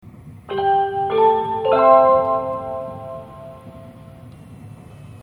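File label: JR West chime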